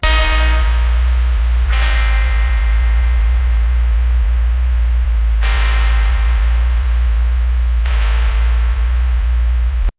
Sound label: cringey sound